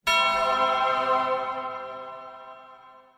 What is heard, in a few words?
Holy Bell